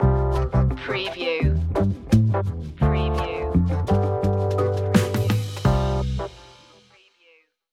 easy hip hop stinger

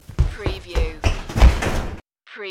Body Run Crash

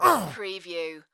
Male Grunt, Human Injury, Pain, Vocal Ugh, Ouch

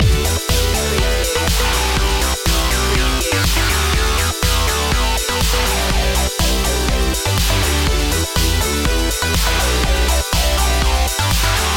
anthem gamer loop

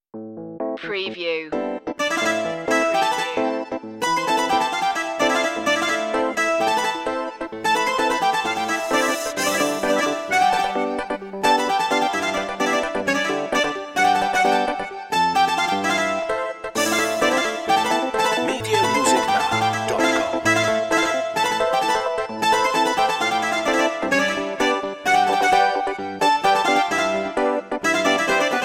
egyptian dance no drums

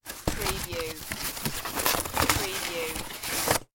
Cardboard Box Open

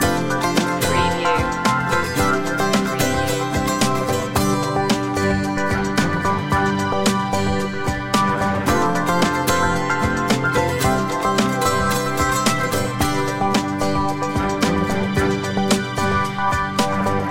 acoustica loop

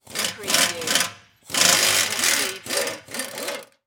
air wrench remove; sound effects bible

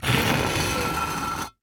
Cinder Block Slide

